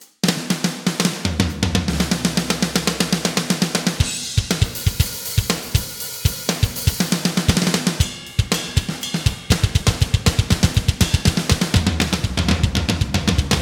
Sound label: enhanced kit